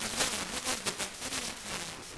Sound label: noise; quantization